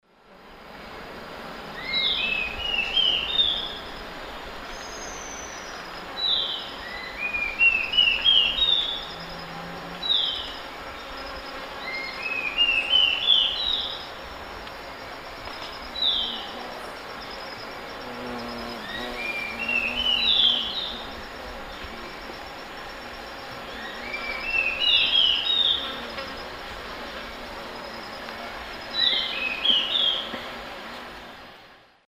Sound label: Sittasomus griseicapillus, song; S. America; Eastern Lowlands